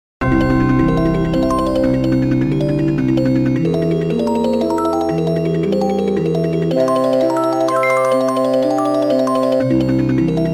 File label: slendro without detuned octaves